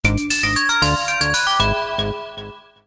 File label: Losing a level; Editor Land